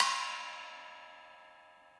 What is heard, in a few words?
CHINA 14 side stick, cymbal; CHINA PE TW 14 side stick atmo; Ableton; Factory Packs; Drum Booth